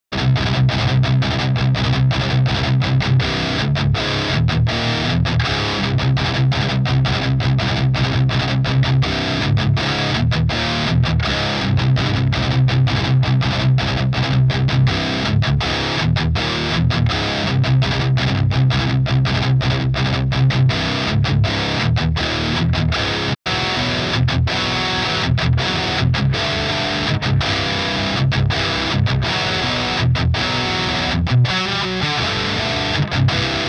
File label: 6n2p cabsim